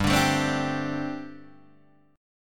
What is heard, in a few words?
G Suspended 2nd Suspended 4th, Guitar DADGAD